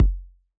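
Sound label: Bass Drum Analog L; Yamaha DJX-2B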